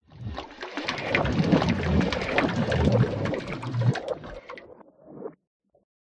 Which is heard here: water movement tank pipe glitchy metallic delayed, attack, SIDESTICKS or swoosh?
water movement tank pipe glitchy metallic delayed